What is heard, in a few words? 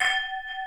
SY77 CUP ECHO, drum; Crypton - DrumBase5000; SY77 DRUMS